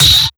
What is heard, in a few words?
Trap Kick; Sonic Foundry (Sony Creative Software); Esoterik Beatz; Trap Kit